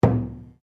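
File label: Drum Taiko forte, Japanese Taiko Drum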